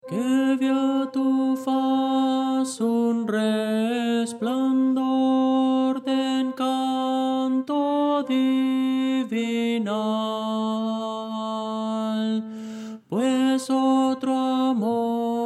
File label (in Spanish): tenor; que vea tu faz; Voces para coro